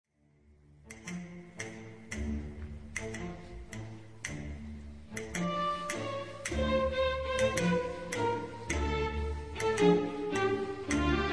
habanera opera without vocals

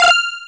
notification sms; ringtones

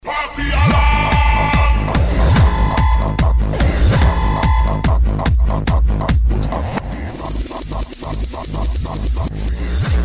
Alarm Tone

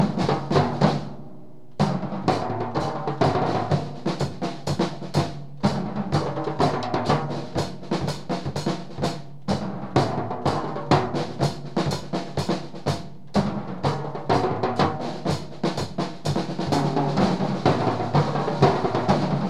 Drum interval

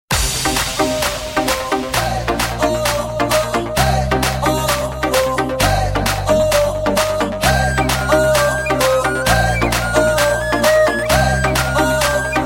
dj mix

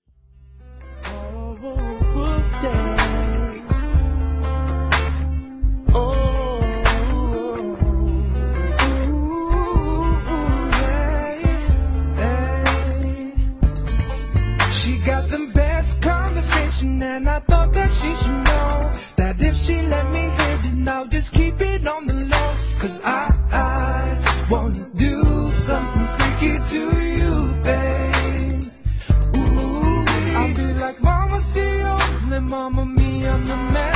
slow jam

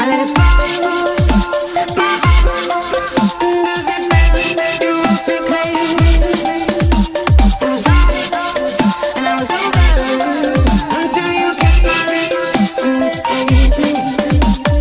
Dubstep

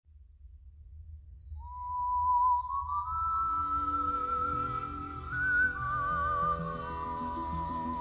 slow ring, classical ringtones

